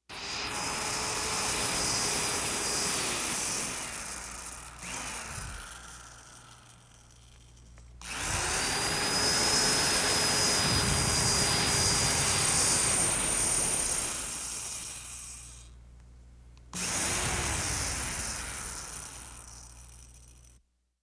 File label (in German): Hausarbeiten Heimwerken Bohrmaschine